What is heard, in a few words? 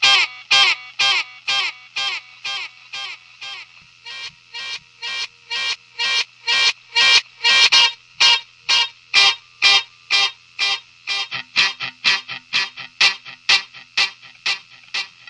back n forth guitar; GUITAR LOOPS